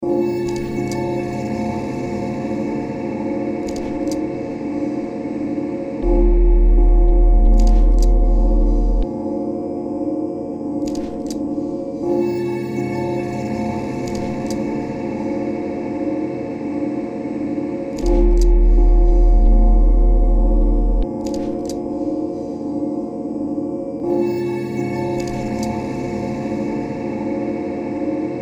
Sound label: Bells Didji